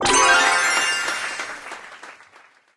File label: win